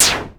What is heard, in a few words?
Shoot, Retro Games Sound FX